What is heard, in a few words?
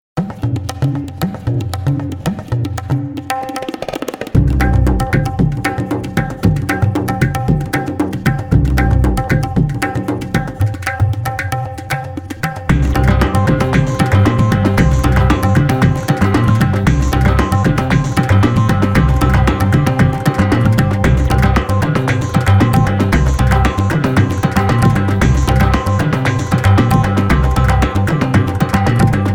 Distant Rhythms